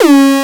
Anasound Short mid C, Industrial Analog Single Notes; Sonic Foundry (Sony Creative Software)